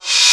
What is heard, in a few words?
Cymbals Reverse; Vengeance Samples